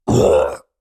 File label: hit injured, Orc Creature; Sounds Great; Orc Creature Library